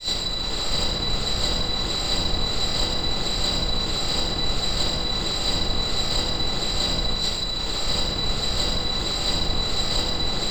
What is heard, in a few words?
Factory Atmosphere